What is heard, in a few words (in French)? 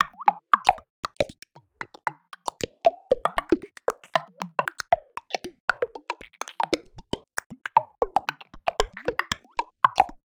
clocs